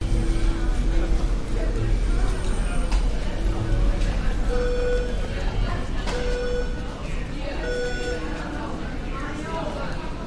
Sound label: seabus station walla beeping steps walking people ambience; vancouver; waterfront station; trolley; your free sounds